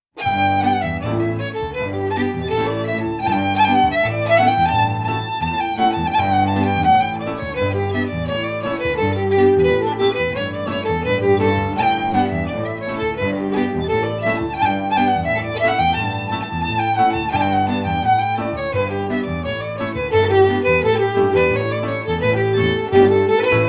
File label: FIDDLE CELLO; Jink & Diddle Book o' Tunes 2021